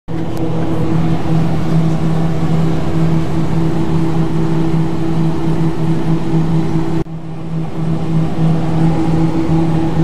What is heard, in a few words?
pizzaria, town; eastern-continent